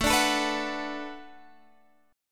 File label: B Minor 7th A, chord